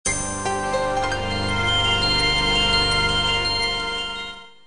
Splash

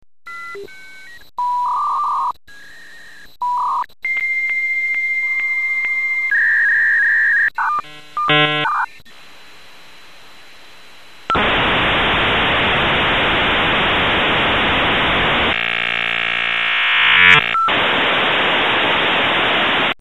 hayes v90, Modem Sounds

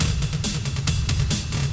drum loop

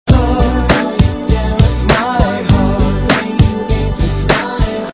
loop

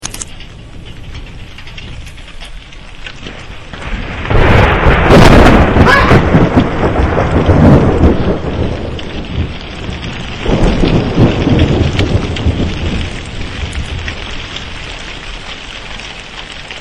thunder crack

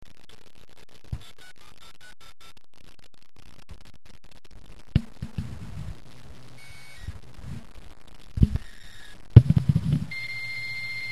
hayes v90 fail, Modem Sounds

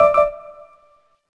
Error

